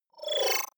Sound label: disappear flicker; maschinen; signale; soundpool